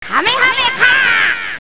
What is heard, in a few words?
open program; dragon ball z